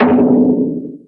hit car

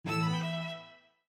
Sonata Battery Critical, windows 7

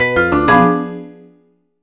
Failure; Kreuzworträtsel DS Welt Edition; NDS

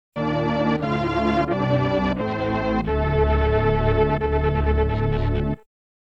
Appearance Fanfare; Super Metroid; Samus Aran

